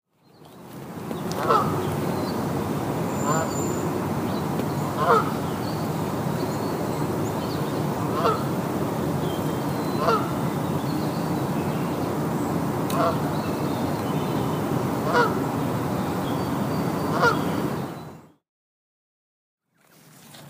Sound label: Canada Geese